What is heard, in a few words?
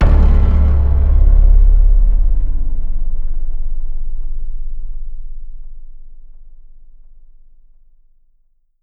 Percu